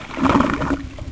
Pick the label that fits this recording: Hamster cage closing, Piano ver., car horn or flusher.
flusher